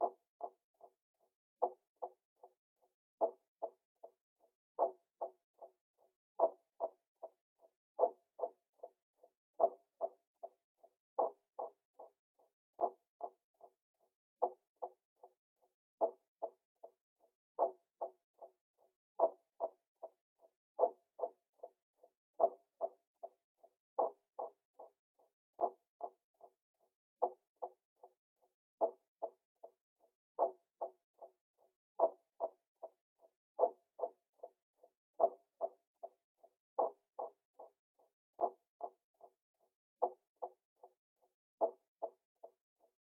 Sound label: 150 BPM A Min Percussive Hit; Loop Stems; Cymatics; Mixed Feelings